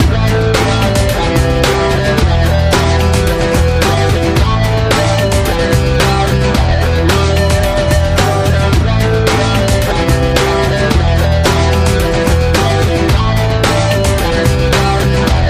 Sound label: Acoustica - Loop